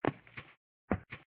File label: Rock Outside Ship